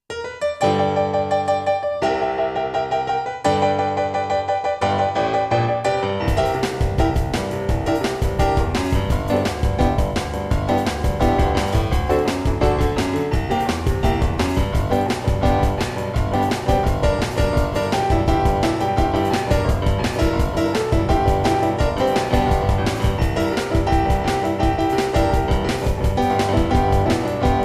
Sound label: Piano